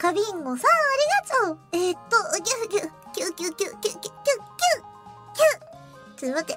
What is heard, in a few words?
sneeze; kanata